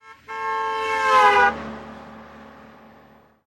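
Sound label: Fast Doppler Horn By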